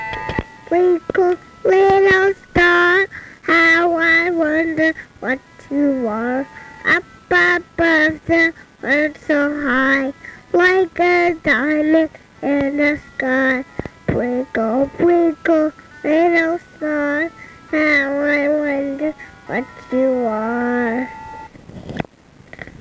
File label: Singing